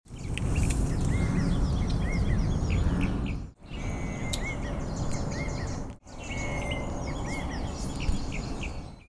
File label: california quail